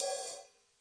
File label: crash cymbal supersoft, DRUMS (SINGLE HITS), Crashes